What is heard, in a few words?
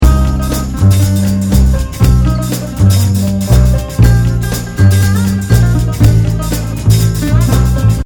loop